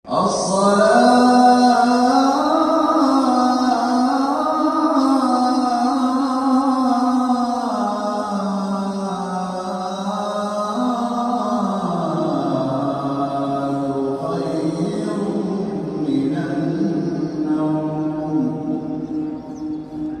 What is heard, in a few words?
Assalatu Khairum Minan Naum Ringtone